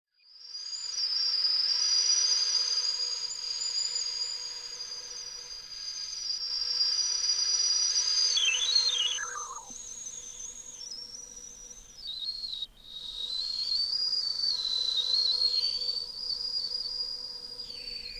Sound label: shofar